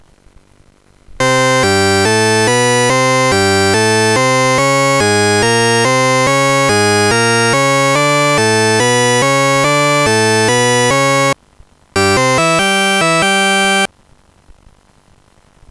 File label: tune; Sonix Music Driver